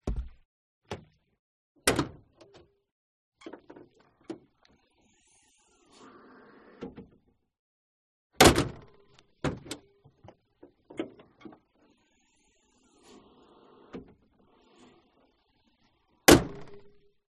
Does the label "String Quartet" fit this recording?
No